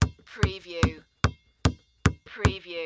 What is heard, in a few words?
Basketball Dribble; sound effects bible